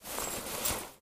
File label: workout pushup; taunt; player; video games; source engine; tf